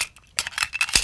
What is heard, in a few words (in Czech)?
nabíjení; Mafie 1